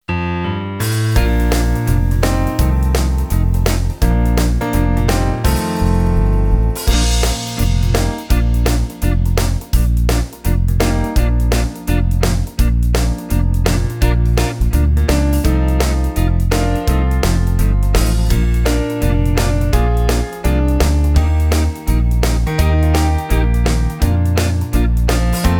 Trombone

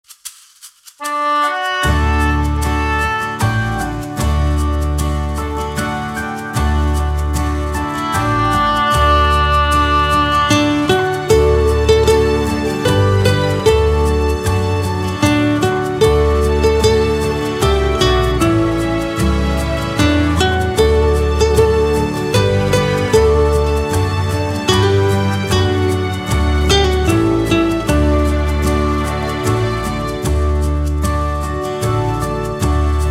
ukulele

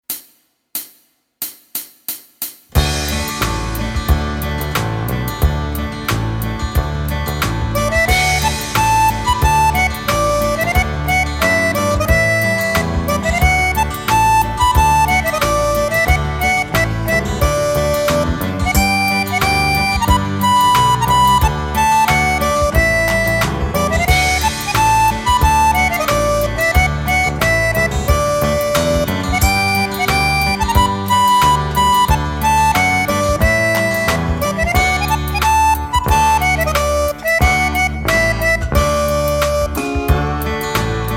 O Susanna To Play Along With, ukulele

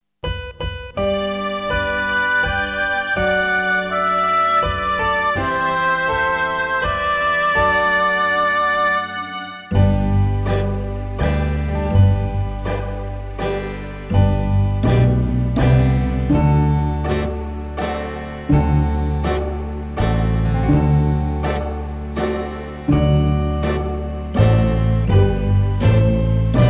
Flute to play along; Guten Abend gut Nacht

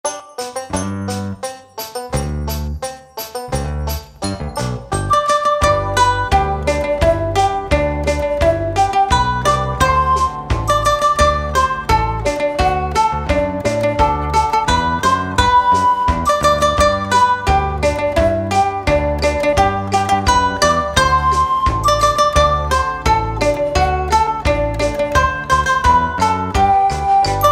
Der Ukulele Song To Play Along With

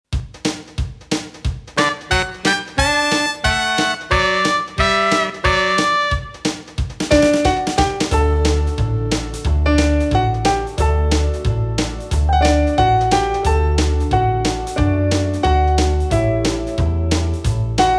ukulele

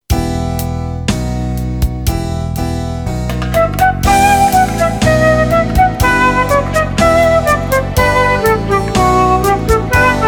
Flute Bourree to listen

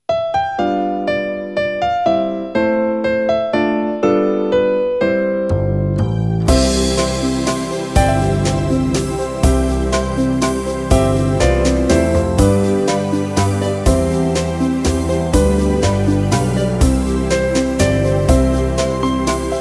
Violin Waltz melody to play along

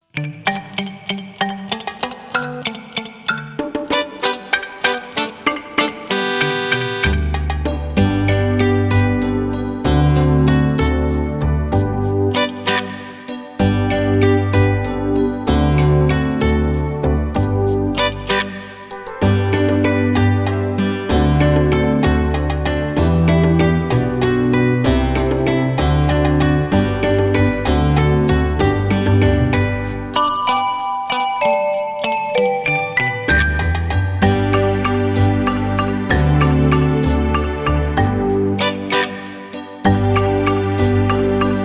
recorder

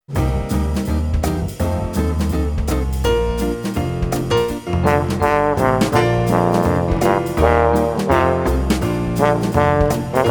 Trombone

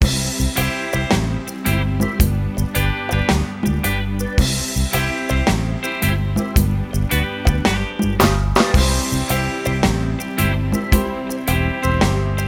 Harmonica to play along, blues harmonica; Michael row the boat ashore

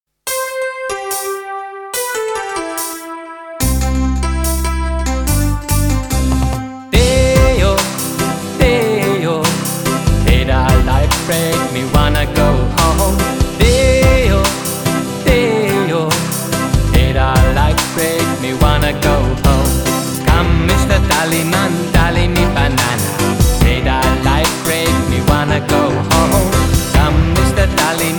Come Missa Tallymann Listening

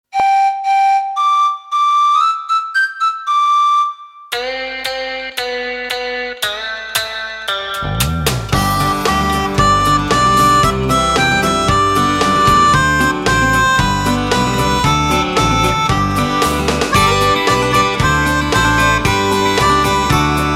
ukulele

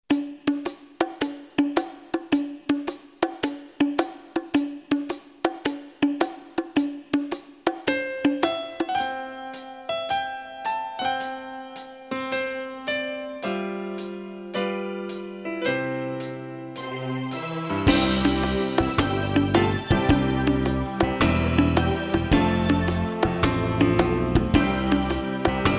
bongos

